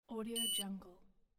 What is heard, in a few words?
heart monitor beep